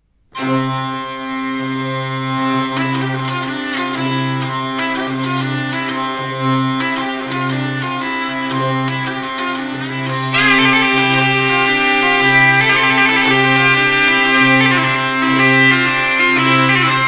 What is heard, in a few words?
platerspil; ductie ninera